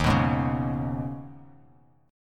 D sharp 7th Suspended 4th Sharp 5th D sharp, Bass Standard; strummed; D#7sus4#5; 11,14,6,6; JGuitar